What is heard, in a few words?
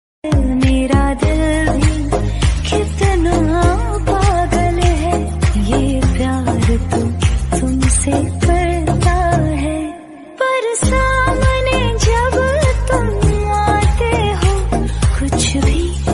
hindi ringtone romantic love ringtone instrumental ringtone